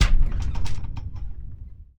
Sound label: ram attack order, siege